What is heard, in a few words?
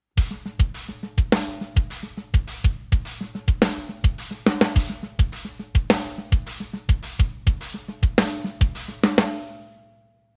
MEINL DEEP